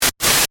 Static Burst, Static, Burst, Stutter, Accent, Transition; Production Element, Imaging Element; BlastWave